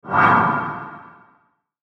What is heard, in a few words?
WHOOSH WARBLE, Whoosh Warble Metallic Airy; Blastwave